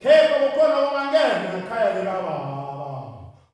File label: "BOUW-BOUW", MALE; Spectrasonics; Heart of Africa 2; S.MALEAMB